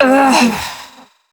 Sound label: Struggle Short; New War